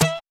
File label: Twang; Ed Edd n Eddy; Adromulus Pack; EEnE